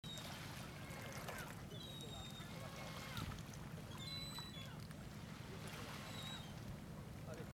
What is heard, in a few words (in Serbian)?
Galebovi i valovi